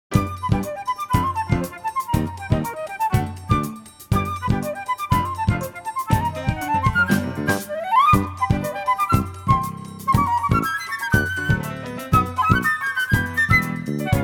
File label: Bossa